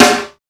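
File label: Wood with Gated Room, Snares; Drums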